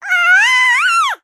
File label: human baby 3 months shout; sound effects one